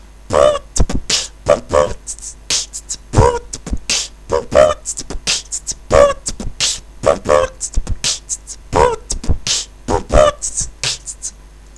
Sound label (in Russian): Очень простой бит, Poo-t-b-kch Poo-Poo-tt-kch-tt Poo-t-b-kch; Poo-t-b-kch Poo-Poo-tt-kch-tt Poo-t-b-kch Poo-Poo-t-t-b-kch-t-t